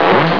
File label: cannon